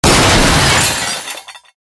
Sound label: grinder bot, ambient; Garry's Mod; tf2